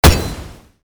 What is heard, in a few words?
attack impact; Li Li Shadowpaw Sound Effects; Li Li Heroes of the Storm Responses; warcraft